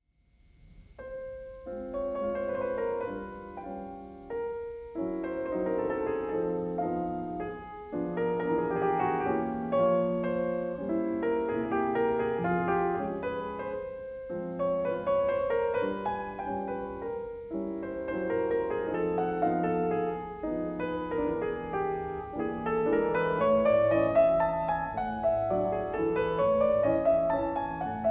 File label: Mazurka; Chopin; Kapell; The collection of mazurkas